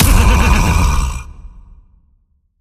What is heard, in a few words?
Pegasus Neigh Hit